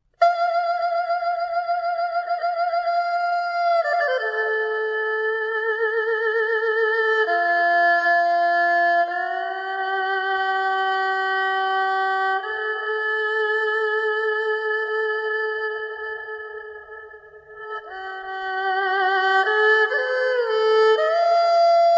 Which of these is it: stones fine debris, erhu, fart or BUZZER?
erhu